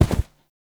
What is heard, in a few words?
Foam Block Impact; Ambience; Gameplay; Warframe; Nineteen Ninety Nine